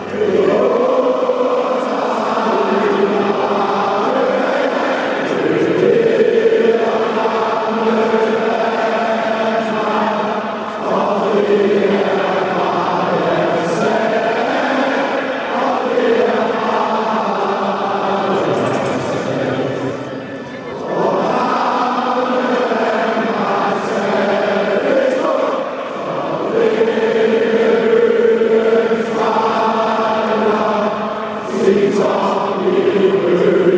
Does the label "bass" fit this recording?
No